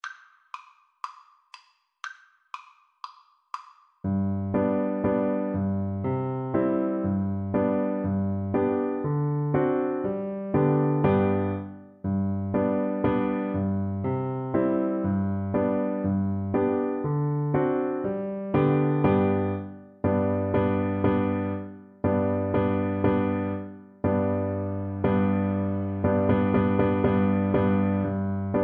piano duet

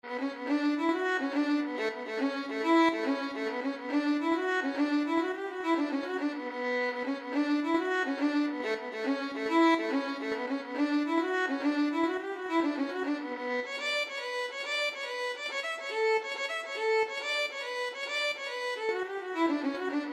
temple house jig violin

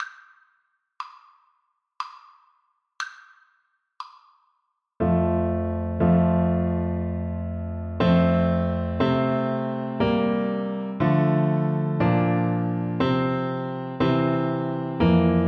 Tuba

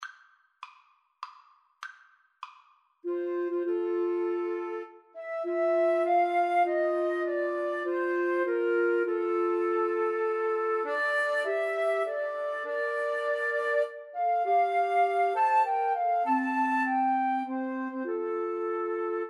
mendelssohn nocturne, 2 Flutes Clarinet

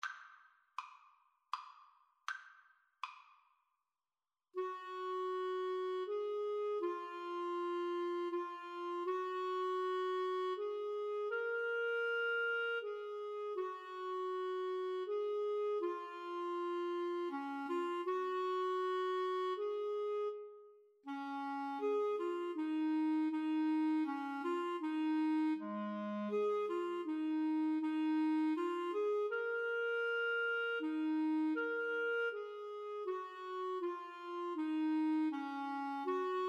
Clarinet Duet

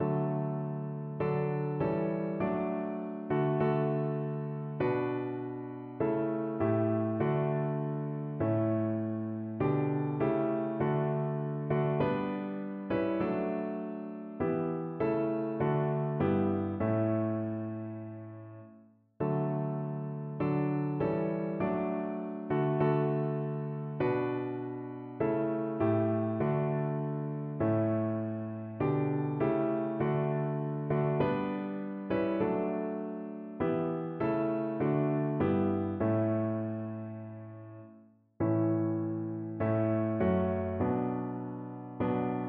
lord of hosts, organ

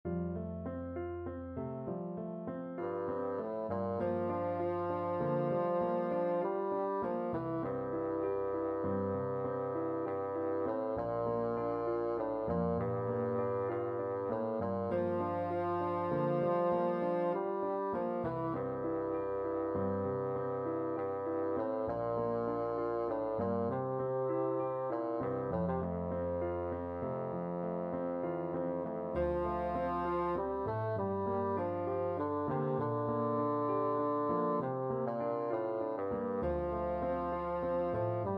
bassoon; women of ireland

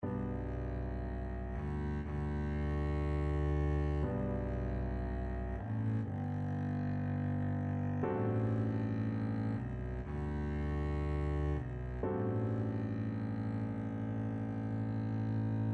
dvorak largo, Double Bass

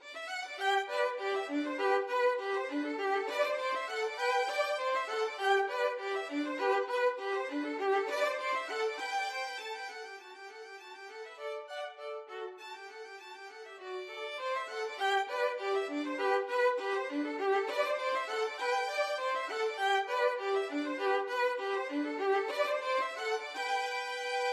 violin duet; seraglio

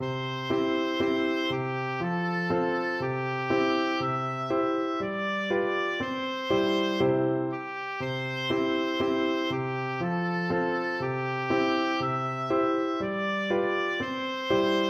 old macdonald, oboe

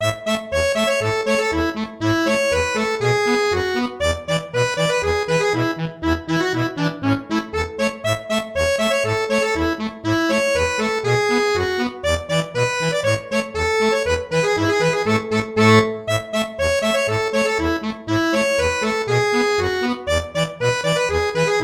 accordion

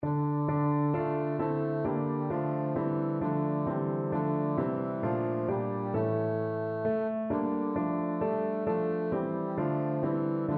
god rest, bassoon

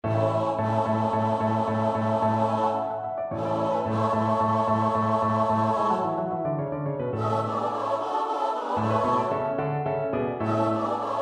joshua redoubled rage, Choir

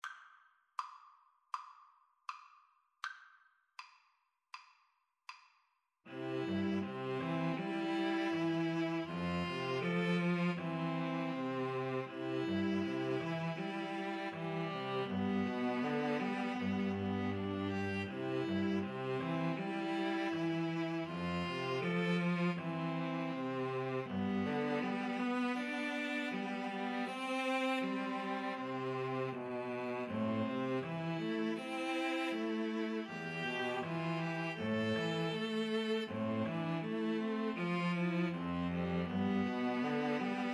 farewell song, string trio

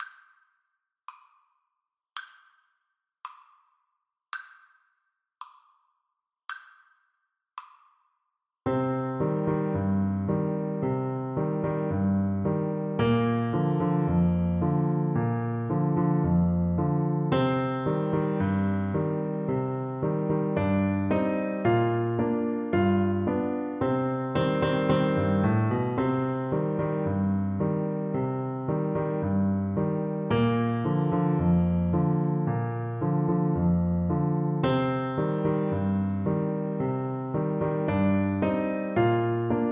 Trumpet

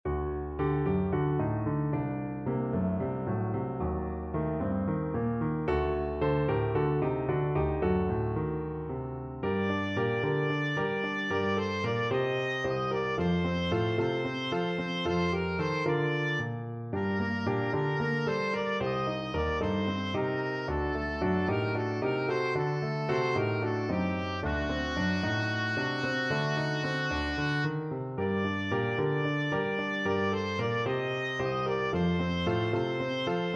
Jovano Jovanke, oboe

